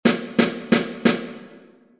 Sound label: Do rythm